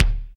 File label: BD Real